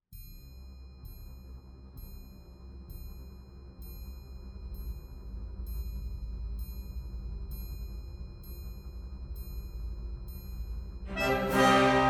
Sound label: piano